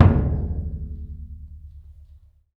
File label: AFRO DUNDUN, LRG DRUMS; Spectrasonics; Heart of Africa